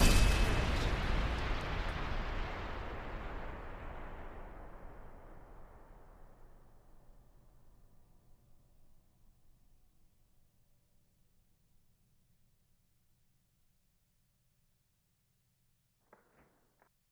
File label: Metallic Explosion; SoundsCrate